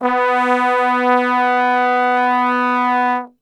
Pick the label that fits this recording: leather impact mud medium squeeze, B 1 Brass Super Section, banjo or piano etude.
B 1 Brass Super Section